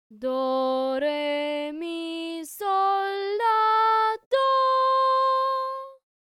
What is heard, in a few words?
solfa scale